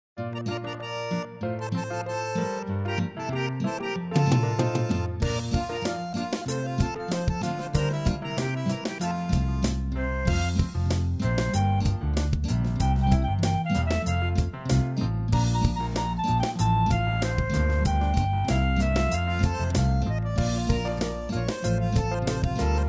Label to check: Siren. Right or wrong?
Wrong